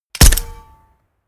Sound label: gun shot silencer designed mechanic